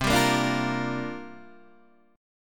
C 7th Suspended 2nd Suspended 4th, Guitar, Open D

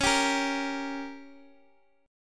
G Diminished; x,x,x,6,8,6

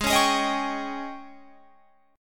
Ab Major 7th Suspended 4th Ab Guitar Open F; strummed; 20,20,20,22,22,20; down

